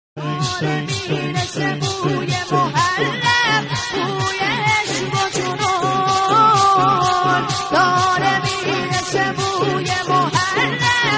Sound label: nohe sedaye nojavan